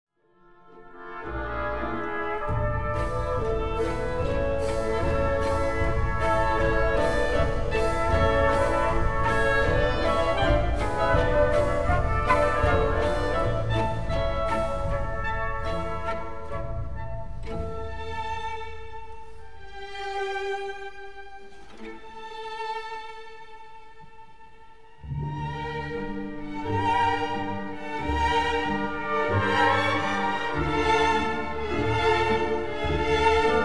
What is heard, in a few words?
Street Oom Pah Pah Schmaltz; Bernstein; mahler 1 3 in callots manier